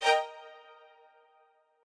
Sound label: strings